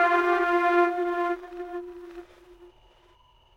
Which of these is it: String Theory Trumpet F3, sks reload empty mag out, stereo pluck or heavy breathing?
String Theory Trumpet F3